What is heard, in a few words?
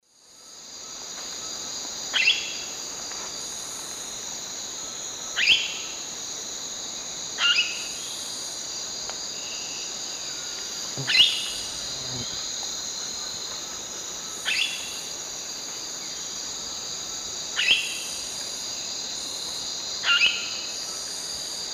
Pionopsitta barrabandi, calls; S. America; Eastern Lowlands